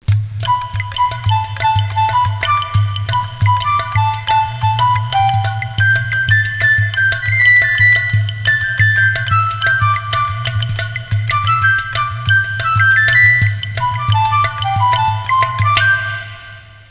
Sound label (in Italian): suonerie musica hawaiian